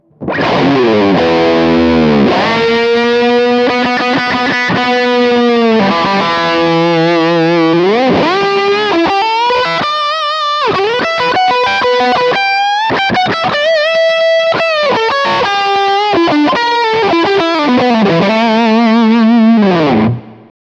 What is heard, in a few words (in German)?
Baltic Blue Fuzz Tone 9 Sustain 3 Uhr Steg Humbucker